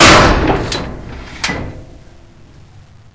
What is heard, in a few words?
metal, debris